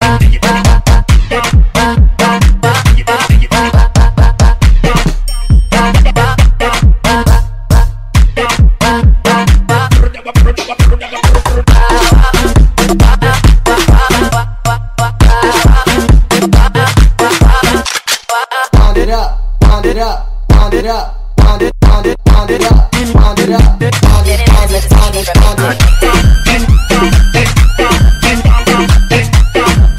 afrobeat

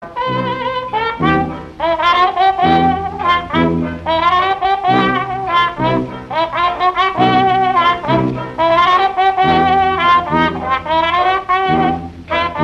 blues

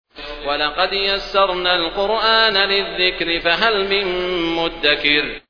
Quran

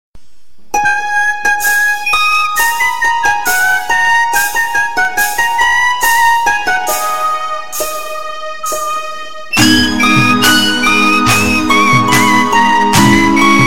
Mix Whistle; Airtel

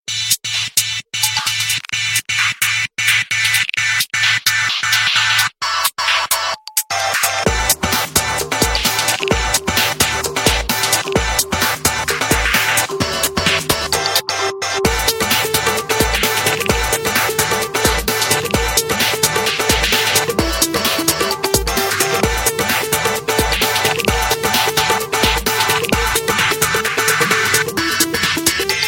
New Nokia Ringtone

